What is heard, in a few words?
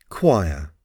choir